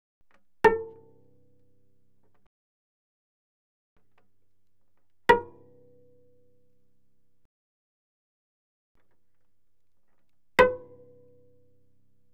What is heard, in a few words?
Cello pizz ff sul D A4 Db5; Strings